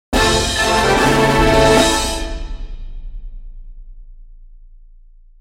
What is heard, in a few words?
Small Item Catch; Ocarina of Time